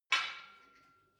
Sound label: barbell weight rattle soft, fitnessstudio